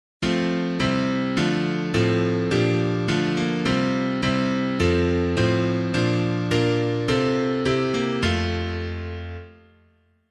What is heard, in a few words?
piano, hymn; Christ Is King of Earth and Heaven; Daniel; LAUS DEO